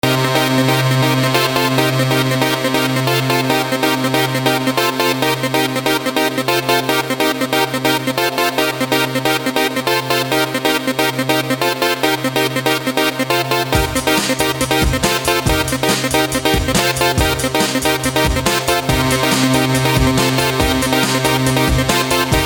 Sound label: battle music test